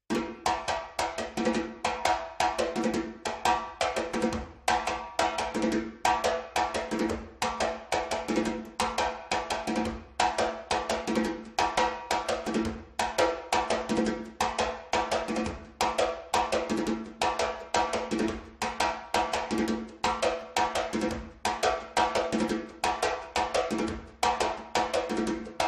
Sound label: timbal